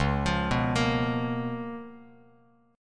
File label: C sharp 13th, Bass Standard; arpeggiated; C#13; JGuitar